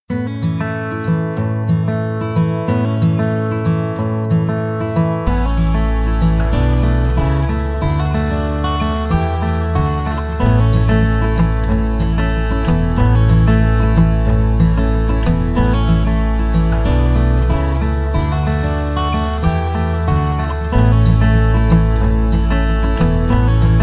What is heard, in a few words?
acoustic guitar